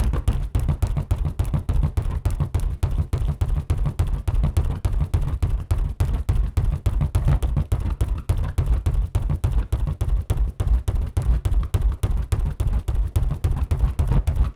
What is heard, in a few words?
WHEEL; SIMUZENSOUND